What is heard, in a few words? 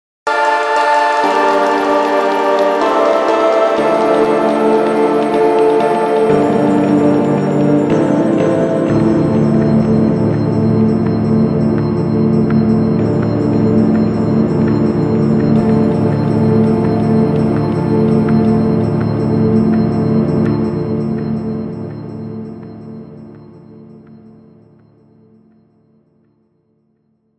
Seriously advanced pad